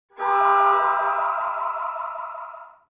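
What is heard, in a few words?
alarm, zones city; Zoom3City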